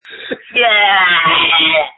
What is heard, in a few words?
Scream